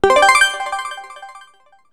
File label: MAP STOCK UP, RESULT RATING UP; maimai